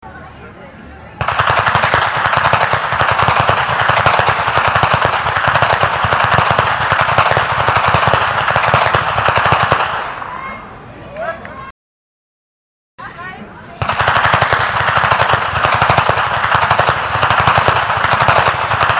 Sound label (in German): Schnalzen; Feldkirchen; Ainringer Schnalzen